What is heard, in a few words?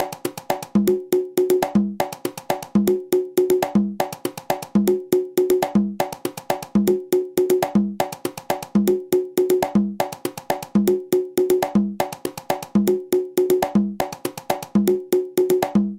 dengue conga